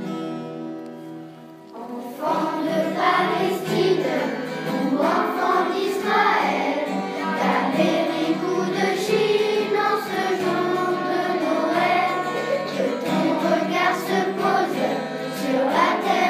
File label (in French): chant noel des enfants